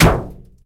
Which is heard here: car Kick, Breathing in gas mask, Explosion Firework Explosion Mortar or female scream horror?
car Kick